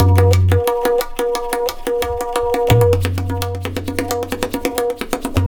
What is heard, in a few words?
tabla, 175 bpm; Zero-G; Pure Tabla